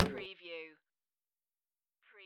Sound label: Pinball sound, little clank